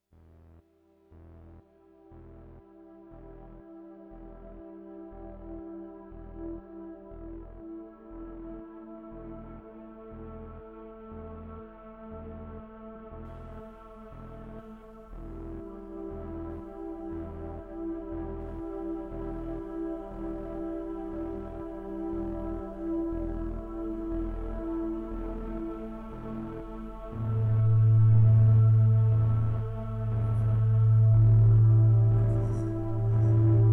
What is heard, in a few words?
synths